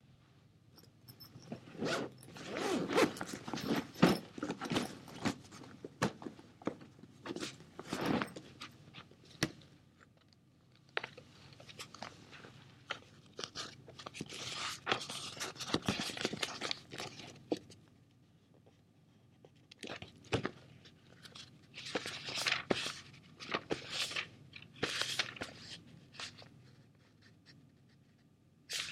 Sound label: Book; Location: 2nd Floor on Axinn Library – Private Study Room